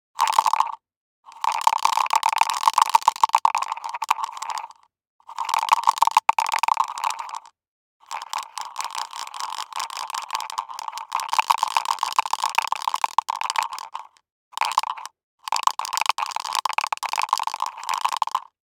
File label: DICE in Plastic Cup, Shake, 1 One Die; Samples; ArticulatedSounds; DICE Sfx Party (Extended)